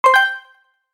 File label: Bluetooth connected headphones success tone; Headphones and Speakers